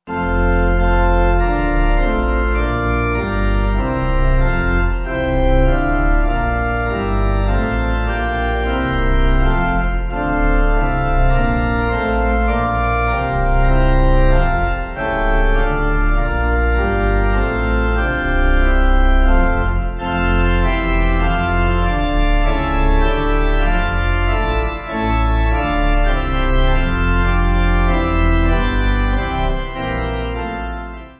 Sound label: Your Word O Lord, Af Himlens, Pipe